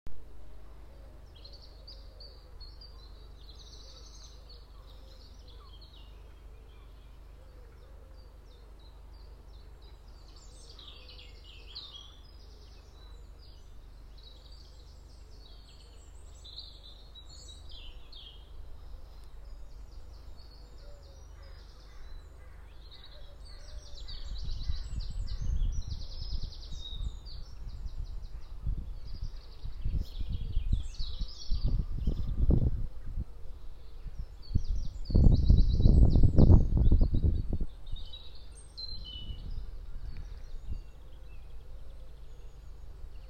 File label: Pause sounds